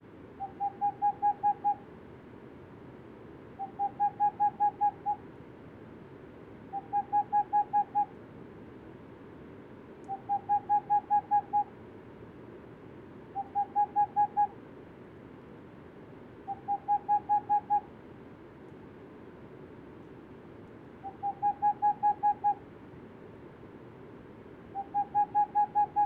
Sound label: Tengmalms Owl Hooting of unpaired male